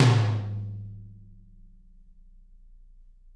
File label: Toms, Drums; Bob Clearmountain Drums I